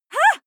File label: female shout surprised; schreie; menschen